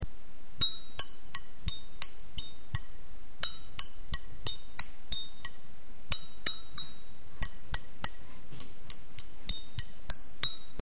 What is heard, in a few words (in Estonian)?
tuulekell